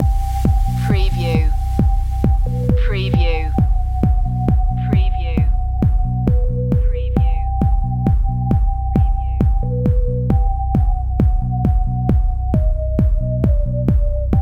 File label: nightfly loop